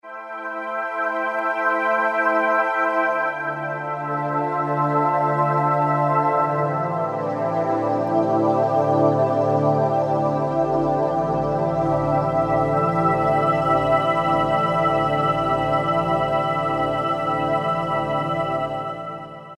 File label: Slow Chor, Alesis Z5; Vintage Synthesizers And Classic Beatboxes